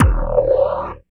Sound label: Drums; Distored Reality